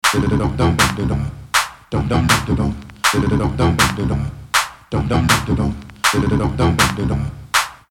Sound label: beat stereo